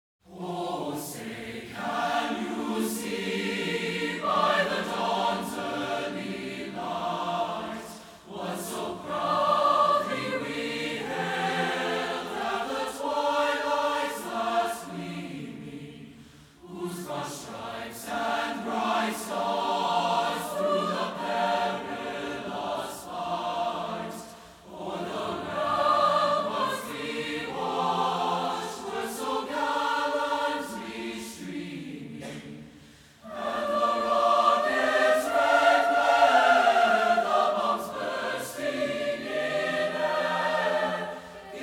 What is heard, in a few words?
The Star-Spangled Banner - SATB, a cappella